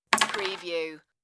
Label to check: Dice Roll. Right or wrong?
Right